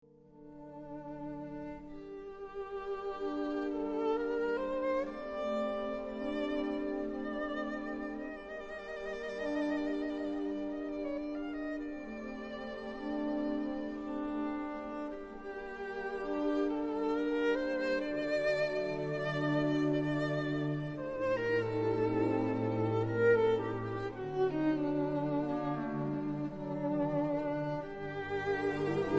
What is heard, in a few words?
Moving violin music